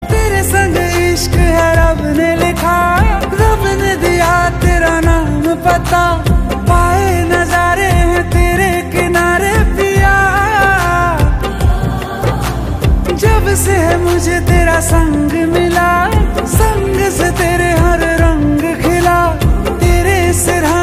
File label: Ringtone